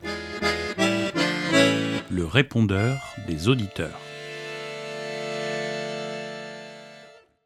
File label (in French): jingle repondeur